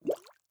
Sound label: Liquid Water Bubble Surfacing Small